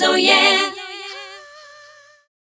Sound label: Oh Yeh Group 139-G, Vocal Lix